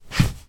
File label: car arm on arm rest